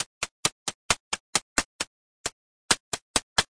133 almost hats clicks, drum loops and breaks

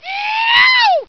Yeeooww

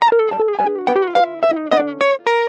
down climbing guitar; GUITARS

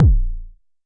Jomox MBase, drum machine; Jomox MBase 01

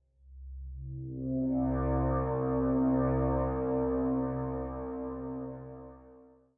Metal Swell C2, Dark Swelling Synth

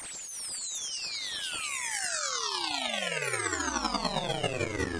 radio tune zwoop down; Radio FM synth type stuff

